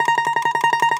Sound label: DOMRA A, Prima Domra, Plucked Strings; E-MU Producer Series Vol. 7 – Old World Instruments